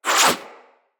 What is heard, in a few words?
Fire Arrow Whiz By